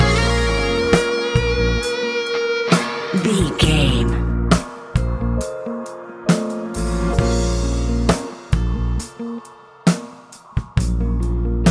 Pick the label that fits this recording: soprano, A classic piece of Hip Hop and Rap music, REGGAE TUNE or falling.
A classic piece of Hip Hop and Rap music